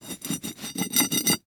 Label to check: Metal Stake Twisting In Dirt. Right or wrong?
Right